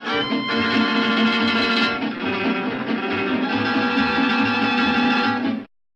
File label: tremolo picking; django